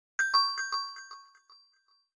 jingle - Medal Silver; StarDrone; PS3

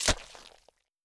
Corpse shit

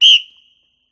Sfx Basketball

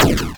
Hop Spider Land

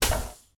hookshot Shot; unsighted

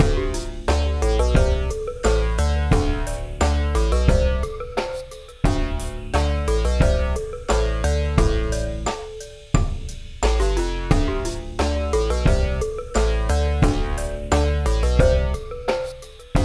Synth; Rhythm Doctor

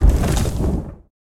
Shake

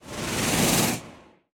Teleport off; Finalboss

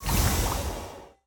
Heal Water

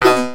Male Hurt Robot; Mars Sortie (Arcade) (gamerip) (2018)

